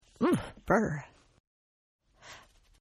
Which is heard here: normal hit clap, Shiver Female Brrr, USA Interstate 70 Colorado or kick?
Shiver Female Brrr